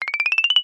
cartoon climb ascend ladder short high pitched lite